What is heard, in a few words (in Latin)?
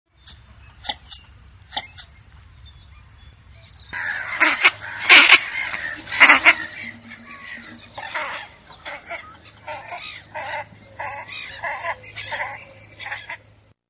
Anas clypeata; voice